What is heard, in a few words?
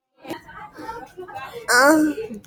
laugh